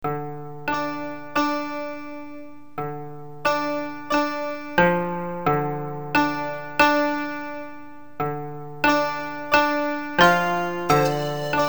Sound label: Loop